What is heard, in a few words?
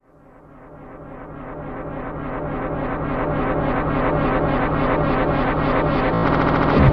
Lysin Swell; KJ Sawka - Drum n Bass & Beyond; Swells